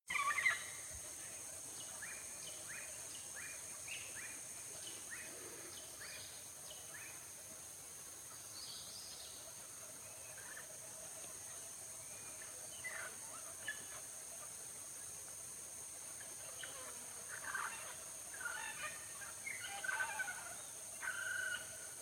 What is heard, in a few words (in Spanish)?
pajaros